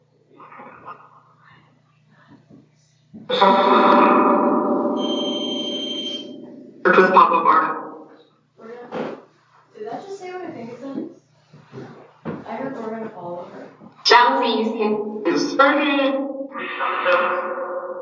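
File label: Train Station Phasma Box; Chessies Room; Mars Train Station – Return Investigation 2024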